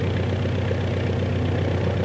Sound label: Motor Motorboat; Working Model Program